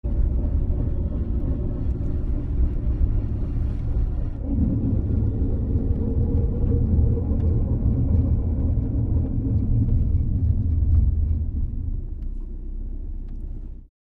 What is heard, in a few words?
Car Breaks Start Sto, brakes